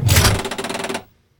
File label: control stick, ships; Cosmic Rage; ships misc